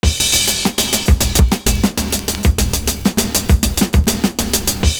16 BEAT, loop; Univers Sons

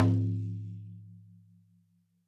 syr bass p, hand drums; SoundBytes - Early Patches

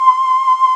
SYN K-2000, Roland

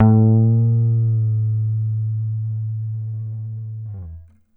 GTR TRIK, Jazzistic; Univers Sons

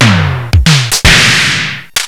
OBERHEIM KIT; OLD SYNTHS; Rubber Chicken Software Sample Bank

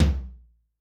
Room Flr, Yamaha DTXpress-II Tom

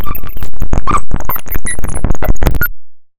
loop, techno atmos

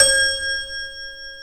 C#4 TOY piano; AKAI S6000 CD-ROM - Volume 1

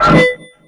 Klang Up; Jungle; FX; Zero-G Jungle Warfare 1, 2 & 3; 90 s Sample CDs

